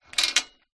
industrial chain hung on metal pole movement short